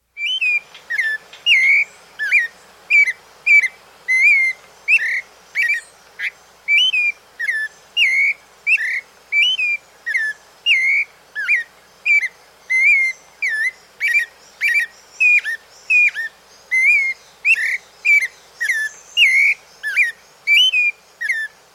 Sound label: Clay-Colored Robin; S. America